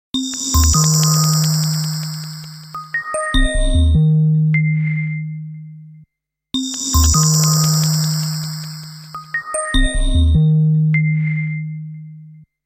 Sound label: Atmos; Windows Phone apps